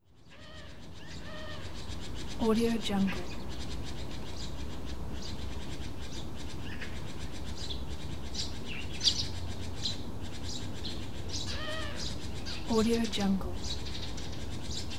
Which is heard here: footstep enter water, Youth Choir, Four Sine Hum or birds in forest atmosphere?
birds in forest atmosphere